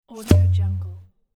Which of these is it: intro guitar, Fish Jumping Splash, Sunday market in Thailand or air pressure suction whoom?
air pressure suction whoom